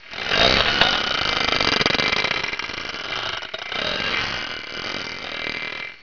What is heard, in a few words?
metal